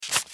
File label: notification; Tecno; M9